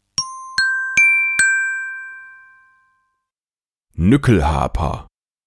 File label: Nyckelharpa